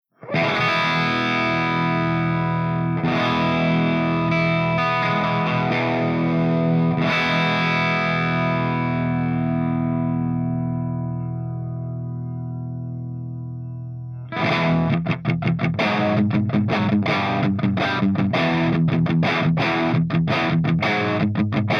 MARSHALL JCM800 CH2 DRIVE GB HB

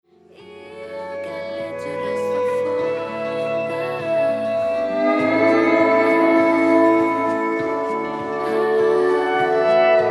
SWELL LAP

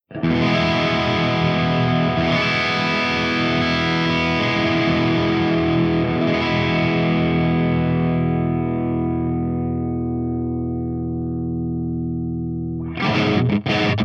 ENGL SOV CH3 DRIVE V30

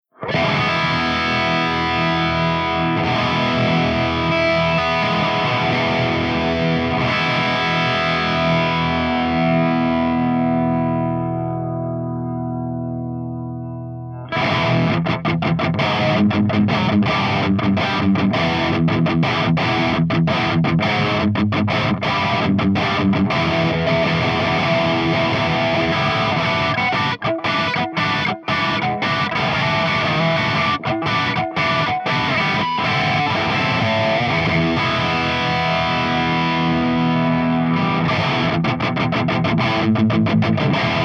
EVH5150 CH3 DRIVE V30 HB

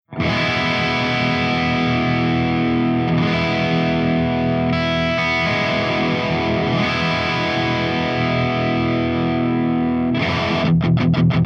ENGL SOV CH3 DRIVE V30 P90

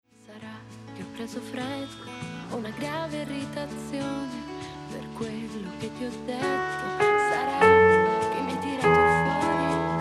KEYS PERCS

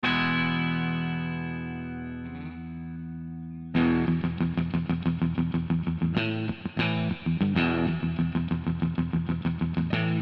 Blend Mono

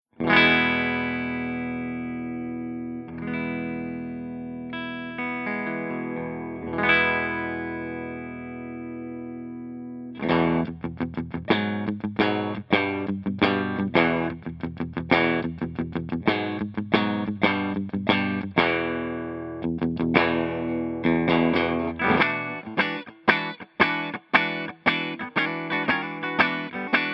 MARSHALL JTM60 CLEAN BRIGHT REVERB P90

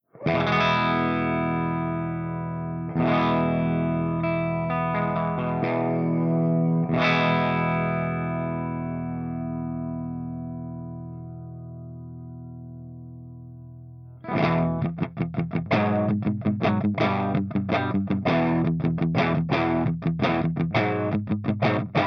PLEXI CH2

